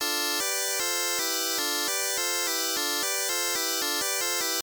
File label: Timer Alert; rygar; arcade